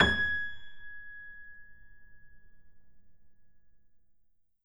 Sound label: Grand Piano G#5 f